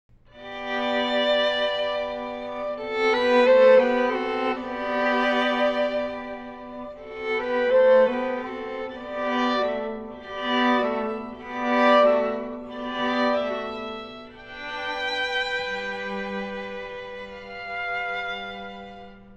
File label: flower duet